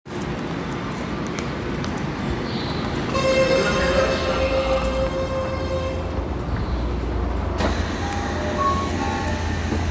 日比谷線北千住南, train sounds

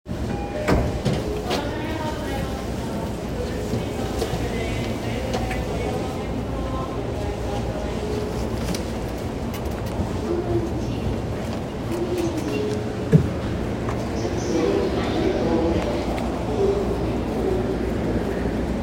JR中央線快速国分寺西, train sounds

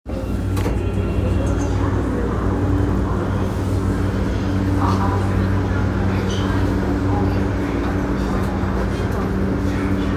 半蔵門線北参道西, train sounds

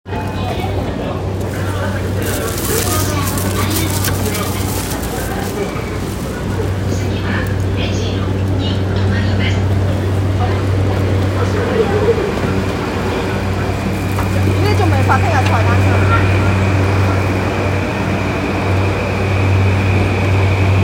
JR山手線池袋CCW

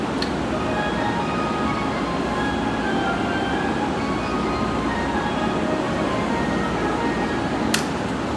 京葉線幕張豊砂東, train sounds

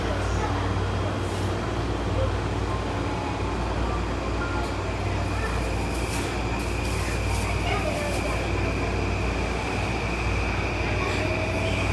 湘南新宿線 西大井 北, train sounds

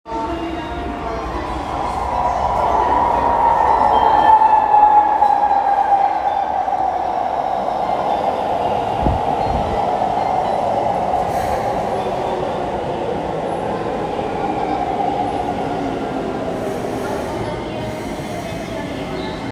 京急本線京急蒲田南接近

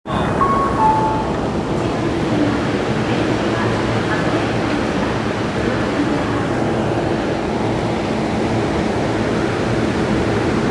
千代田線湯島西

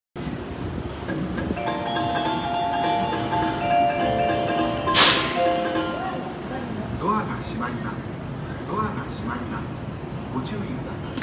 天満発車, train sounds

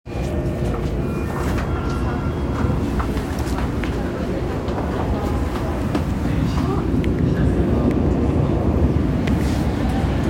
日比谷線東銀座北, train sounds

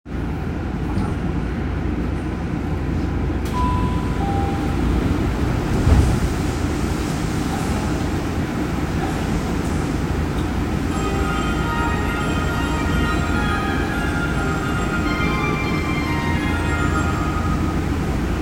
副都心線北参道北, train sounds

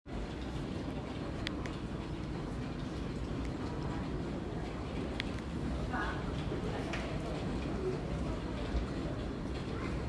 小田急線祖師ヶ谷大蔵西，東接近

